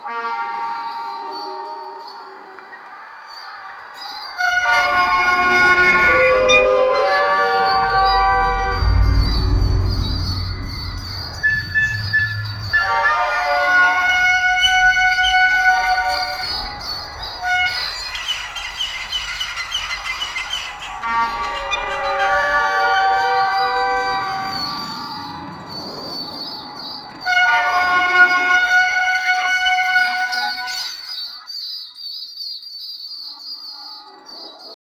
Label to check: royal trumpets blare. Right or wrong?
Right